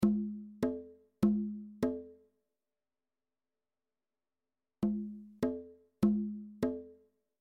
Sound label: bongos; reciclar tocar